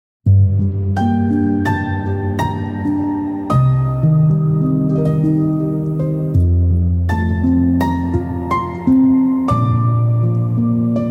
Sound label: Piano Dreams